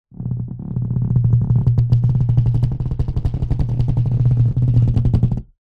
wood vibrating soft massager on wood surface bounce vibrate; foley